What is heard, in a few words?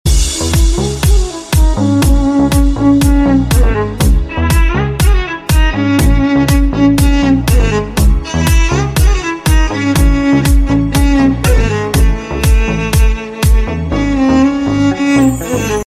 arabic house mix ringtone